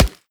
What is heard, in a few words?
cardboard, bullet impacts; physics; Half Life Model Pack